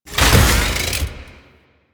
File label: Necramech Ranged Vault; Enemies; Warframe